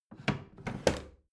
bathroom drawer open regular

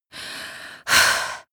exhale female

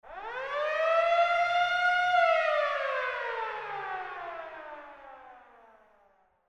siren

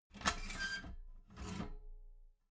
metal ladder small handle fold soft

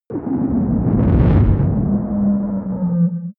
spaceship engine slow down

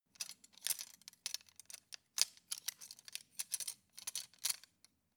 cutlery plastic multiple rattle

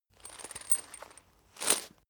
chain mail movement

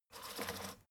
fader plastic stutter regular

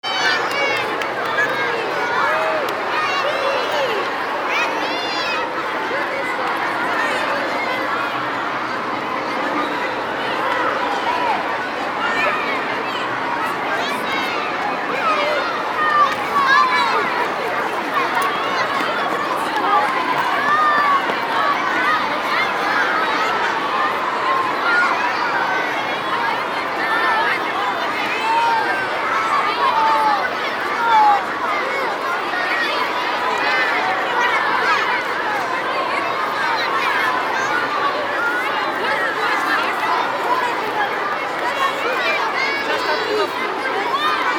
children group

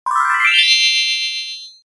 move up glissening

